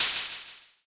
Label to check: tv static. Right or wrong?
Wrong